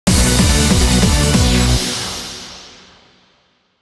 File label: Boss Battle Start; bike rider dx series super bike rider; DX3